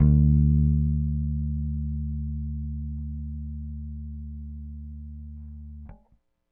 Bass, Indie Rock

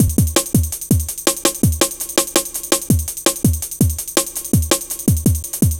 Step Off, drum loop, jungle, 165.5; Zero-G Jungle Warfare 1, 2 & 3

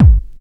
dance 1, bassdrum

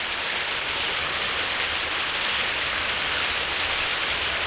Ice Cave, On The Glacier

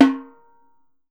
SOPRANO, Brazil Drum Perc; E-MU Producer Series Vol. 7 – Old World Instruments

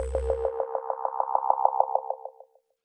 Dance Mega Synth; Best Service; 90 s Sample CDs

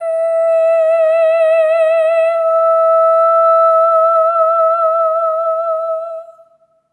EH OH E5, SOP; Spectrasonics; Symphony of Voices

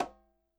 CH cl m, KILLER CONGA

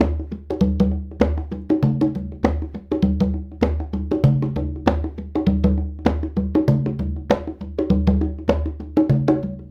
Congas; Essential Percussion

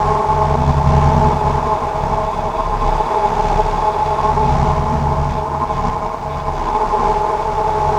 Drone Ambient; Drums & Drones

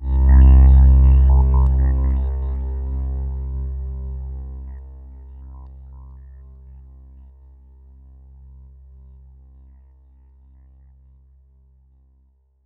C1, SynthLine; 90 s Sample CDs; NiceBeats; SynthLine VOL-10

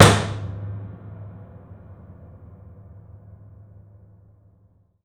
SLAM LID; InVision Interactive

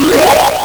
LSDj, Nintendo Gameboy; 300 Drum Machines

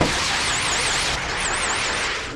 whirling, Anime Sound FX

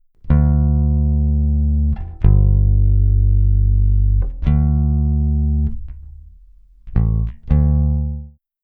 Jazz Bass, African; Sonic Foundry (Sony Creative Software); Continental Drift Aftershocks

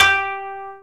KOTO G3, Asian Traditions; USB Soundscan vol.60 - Asian Traditions; AKAI

